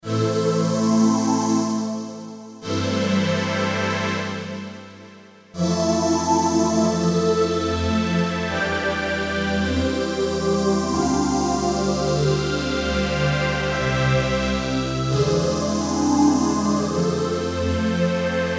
Strings, string machines; electrickeys; Cruma

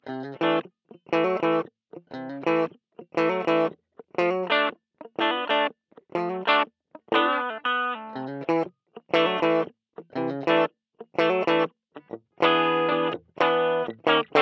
diamond drive dry, guitar

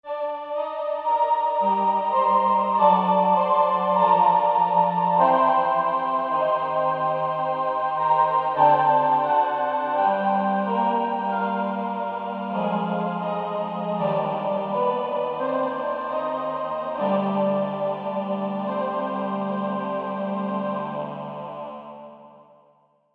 VP Choir, string machines; electrickeys

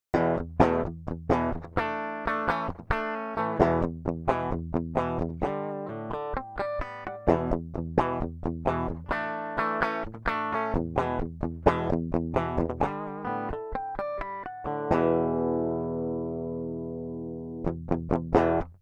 tube wailer dry, guitar

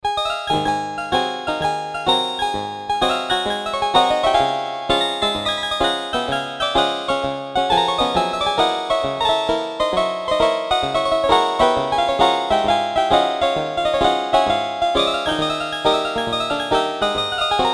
Log Piano, rare and bonus keyboards; electrickeys

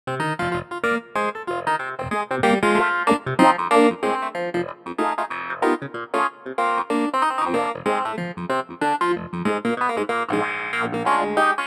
D Clav filter mod, funky clavs; electrickeys

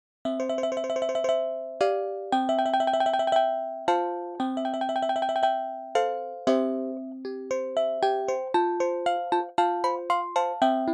Clavibes, electro mechanical keyboards; electrickeys

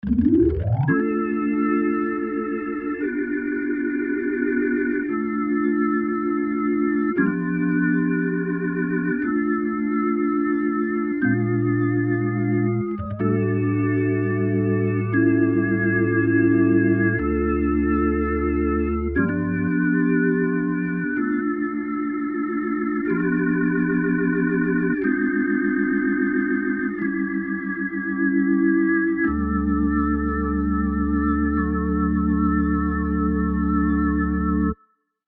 Vib, the b electric organ; electrickeys

